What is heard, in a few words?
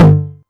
tom mid, Novation Drumstation